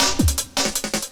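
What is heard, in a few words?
LOOP snare drum, Drum & Bass, 160 BPM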